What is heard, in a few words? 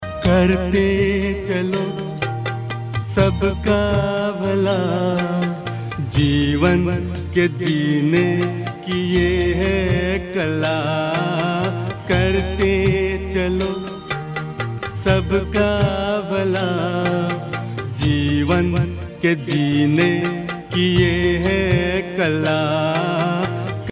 Mobile Ring Tones